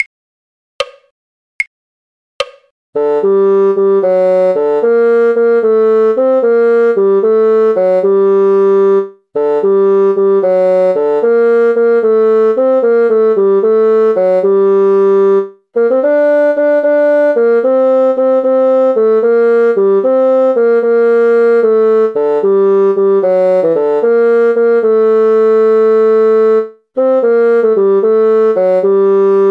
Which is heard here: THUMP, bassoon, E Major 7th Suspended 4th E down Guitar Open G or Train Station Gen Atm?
bassoon